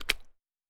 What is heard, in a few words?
Mud